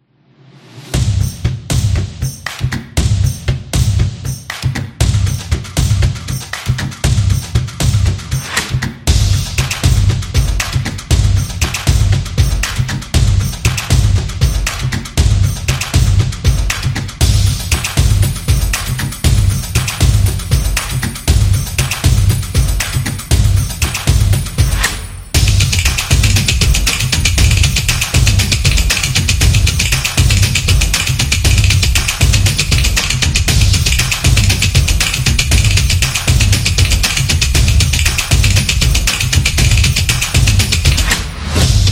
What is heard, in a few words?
Percussion